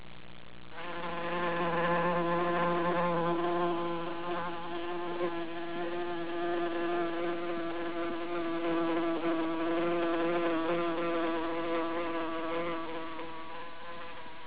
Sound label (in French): mouche